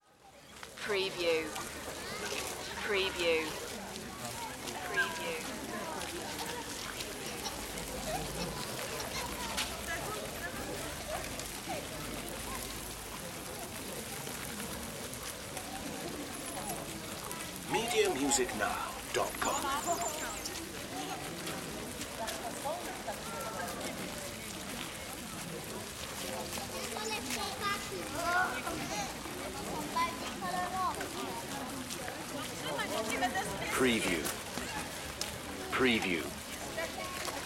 Crowd, Park Ambience Busy

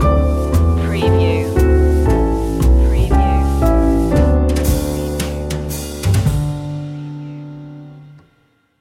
silent night jazz stinger

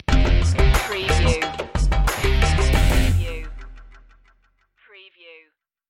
the magic sitar stinger

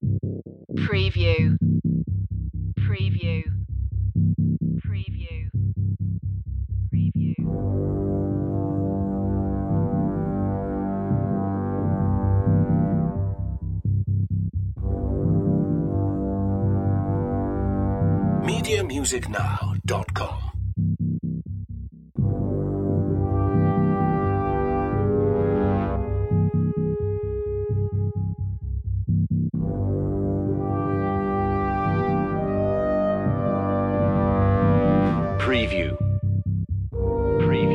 no perc

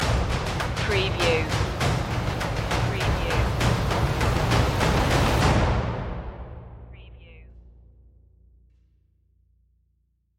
perc stinger

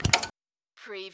Servo Panel Sound - Switch TV

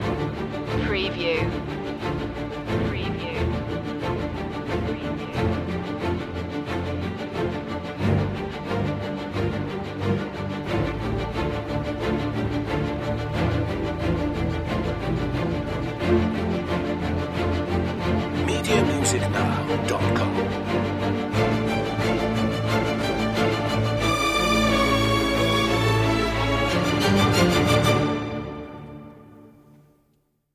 strings only

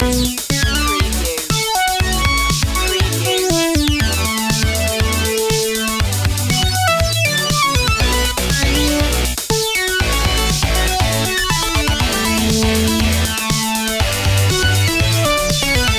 super chip world loop

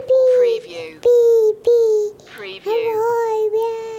Infant Bee Bee

